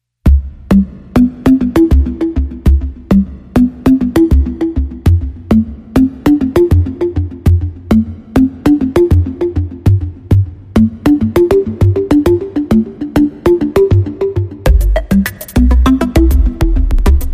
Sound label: Airy Bubbles Underscore